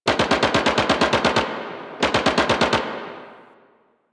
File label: Distant Gunfire; Ambience; Warframe; NineteenNinetyNine